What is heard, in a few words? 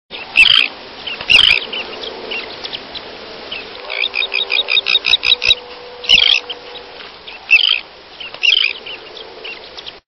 Elegant Tern; Birds